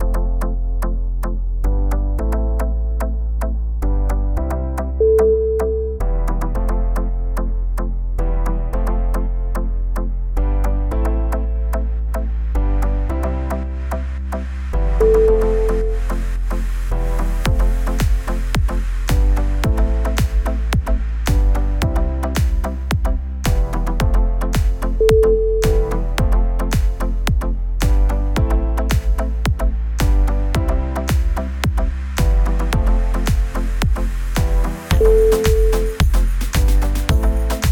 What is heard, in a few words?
commercial electro pop